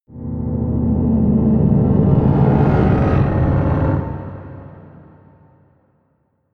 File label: scrapes scary dark, drones